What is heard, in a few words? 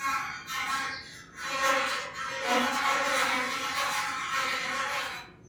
flies; dfcaverns; df ambience